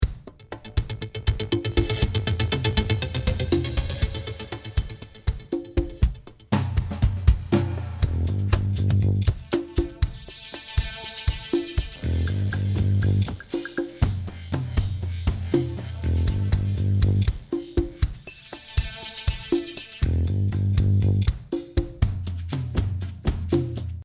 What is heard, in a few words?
bass